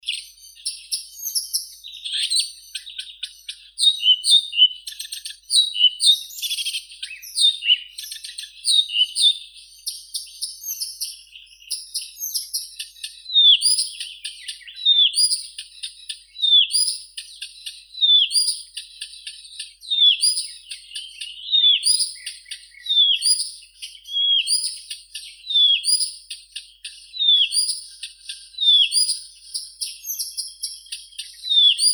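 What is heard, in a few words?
blyth's reed warbler, acrocephalus dumetorum; kamishovka sadovaya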